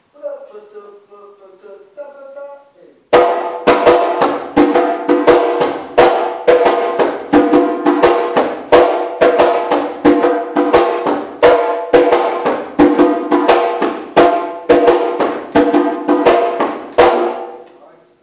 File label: Djembe